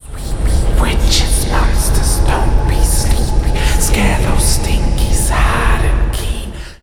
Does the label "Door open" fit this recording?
No